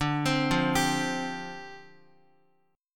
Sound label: D Minor 11th D, Guitar; Dm11 arpeggiated; JGuitar